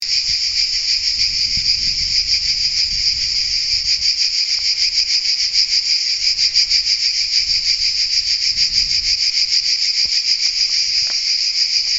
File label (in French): cigales